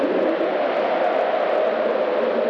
blizzard; winter

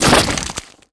corpse explosion

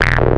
ps die, plasmashield; zaero; items

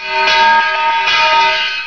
fall; dalek

